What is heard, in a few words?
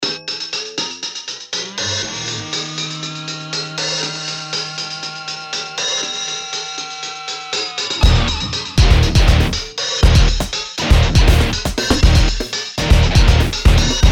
Vinyl Rock; Stylus RMX